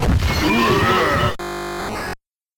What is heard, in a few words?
Death Sound; Teleroboxer; Virtual Boy gamerip